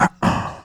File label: VOX1, Roots Disco; USB Soundscan vol.32; AKAI